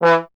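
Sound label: bass trombone E; Miroslav Vitous; Solo Instruments VOL-1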